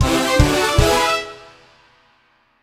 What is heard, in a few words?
Level Up; mario tennis aces gamerip